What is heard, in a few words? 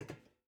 footstep rubber boots metal walk; Rust